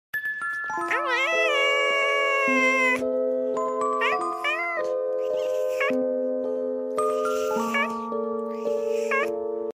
nada notifikasi wa kucing

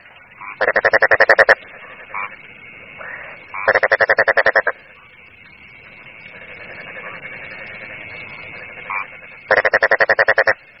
nada dering suara kodok

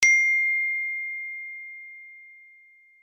nada notifikasi iphone catatan

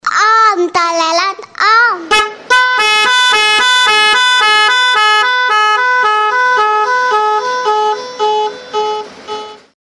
nada dering om telolet om

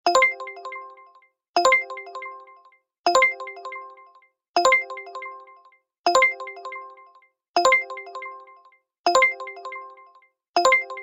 nada dering uber driver; terima orderan

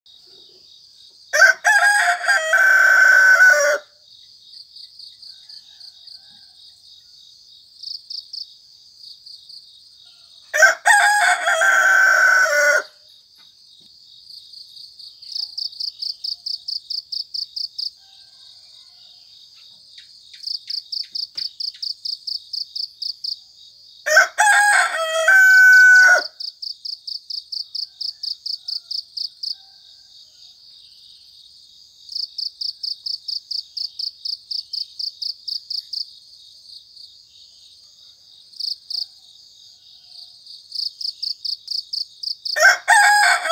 suara ayam berkokok di pagi hari

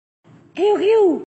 nada dering wa 1 detik lucu

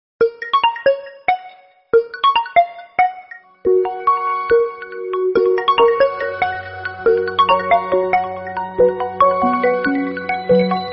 nada dering panggilan Oppo original

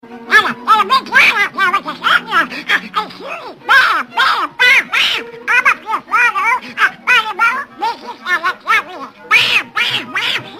suara bebek ngomel

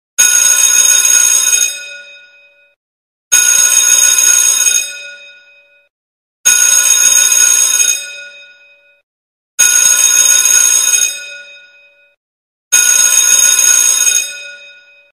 suara lonceng bell sekolah; untuk tugas film

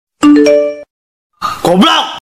nada notifikasi wa versi goblok

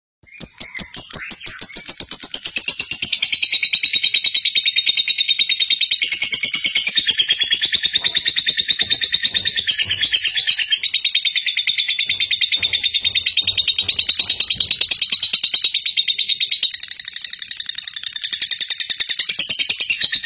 suara burung lovebird juara

